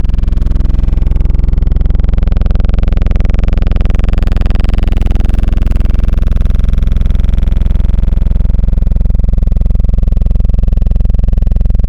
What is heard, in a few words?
Saw Squeeze; Synthetic's Serum Collection; ESW Core Wavetables; Echo Sound Works Core Tables